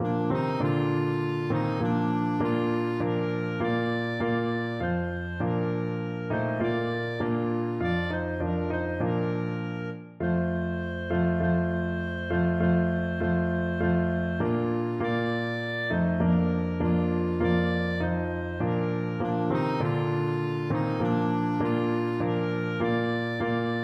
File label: lovely christmas morning, clarinet